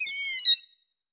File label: bird killdeer